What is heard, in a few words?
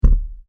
Bang On The Door, Door Knocking; Door Knocking (Sound Effects:Doors)